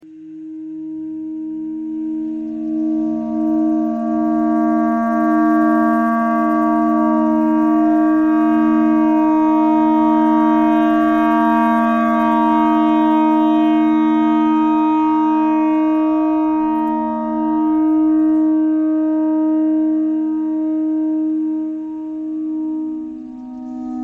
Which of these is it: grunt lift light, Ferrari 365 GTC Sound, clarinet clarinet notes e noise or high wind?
clarinet clarinet notes e noise